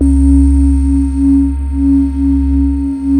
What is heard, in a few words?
PAD pad; Best Service Gigapack 1